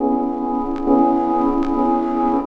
Chords Tones; Equipped Music; Loungin House

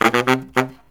BARYT, Killer Brass Riffs

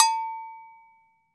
AGOGO BELL

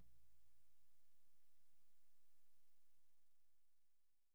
YAM18, drums; Big Fish Audio; Ross Garfield Drums VOL-2

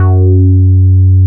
OCT BS F#2, Vintage Keyboards; Big Fish Audio; First Call

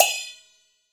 Nao Bo; Cymbals

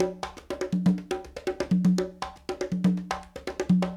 120, Latin Congas; Spectrasonics; Supreme Beats

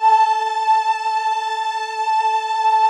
VP-330, String Machines; Best Service; XX Large Most Wanted 2

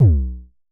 Festival Kicks - B1, Kicks, Vermona Kick Lancet; LookIMadeAThing Kicks

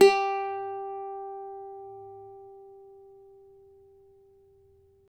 CAVAQUINHO G, Guitars; Spectrasonics; Hans Zimmer Guitars VOL-2

